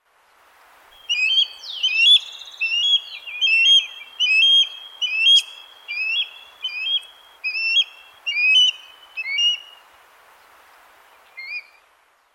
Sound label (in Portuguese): águia-pescadora